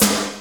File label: old school crunchy snare one shot g sharp key